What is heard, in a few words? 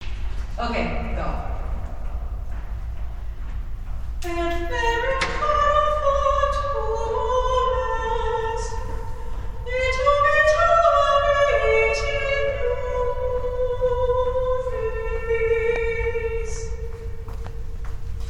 Singing Soprano In A Tunnel